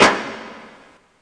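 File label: hi closed; drums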